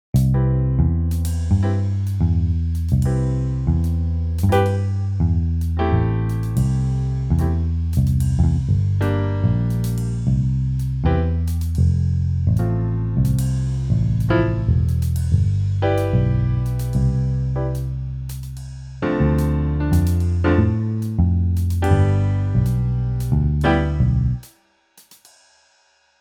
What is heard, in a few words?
GarageBand Midi